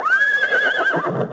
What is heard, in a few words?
whinny; DAoC